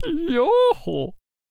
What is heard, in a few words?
Chariot Attack Vocals